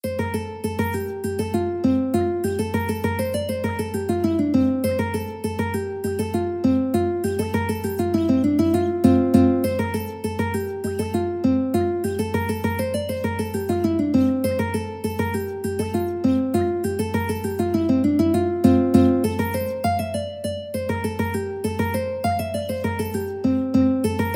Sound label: Galope ukulele